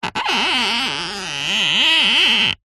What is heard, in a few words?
string rub wood squeak; foley